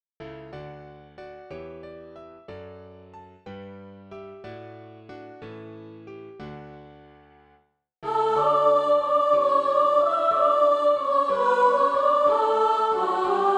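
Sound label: soprano, Practice Music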